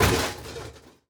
metal break

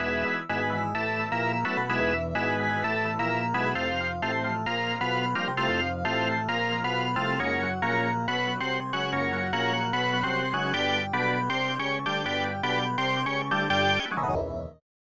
Organ Chant

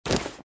Hang Land

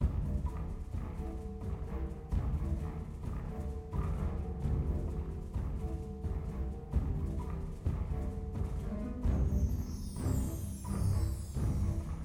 Tension; Jurassic World Evolution 2; PS4, PS5, Windows, Xbox One, Xbox Series X/S

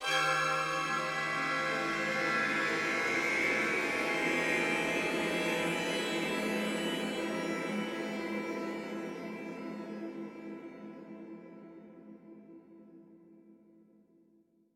Stingers - Scary; Planet Alpha

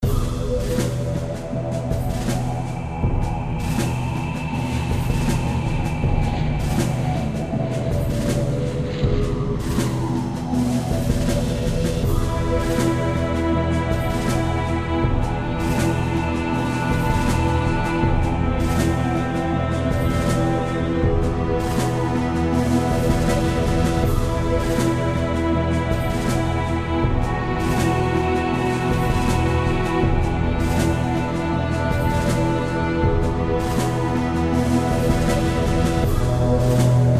Menu Music; project reality bf2 1.6

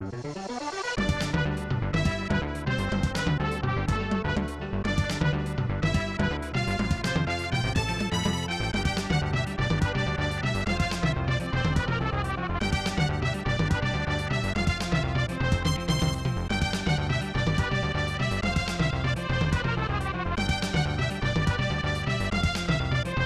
Tournament Victory; J.League Excite Stage 96; SNES

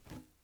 glass jump

